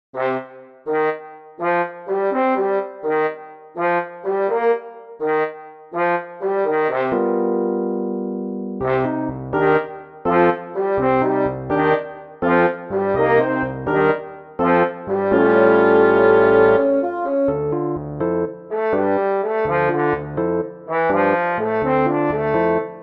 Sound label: dual ensemble; choreographic